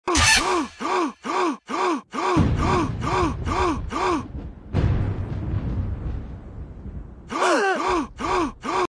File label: clock